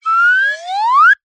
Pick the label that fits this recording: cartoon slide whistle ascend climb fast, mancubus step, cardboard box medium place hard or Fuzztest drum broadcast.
cartoon slide whistle ascend climb fast